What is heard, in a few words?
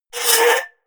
scratch